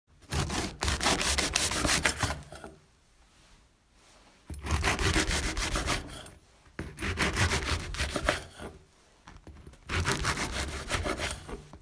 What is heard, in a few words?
Cutting Bread